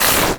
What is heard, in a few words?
imp chatter combat, monsters; DoomRTX; imp5